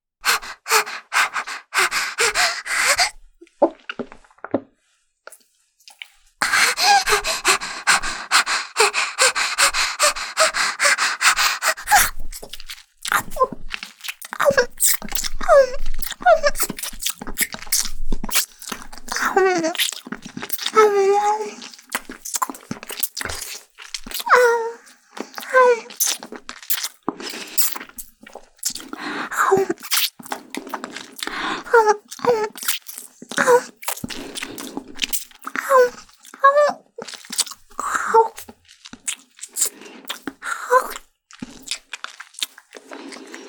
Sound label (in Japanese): 感じるキス, ASMR